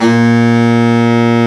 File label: BARITON SAX